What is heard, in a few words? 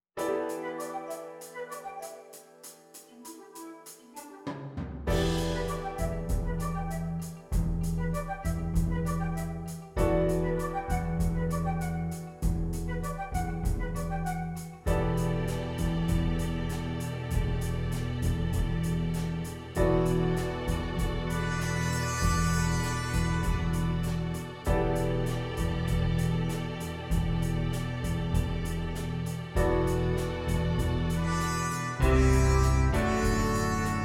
backing only